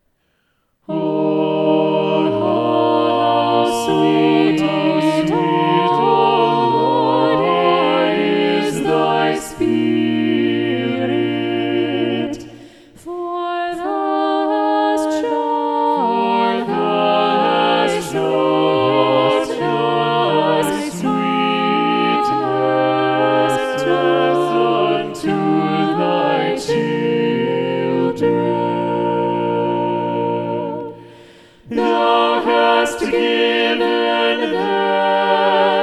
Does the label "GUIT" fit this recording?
No